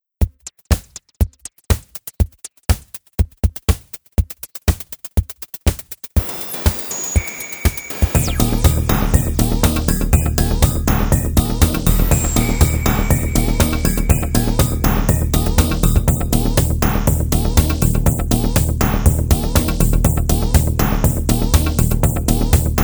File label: drums